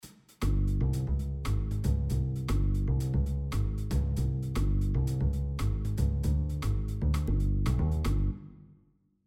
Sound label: Dusty Dance Quantized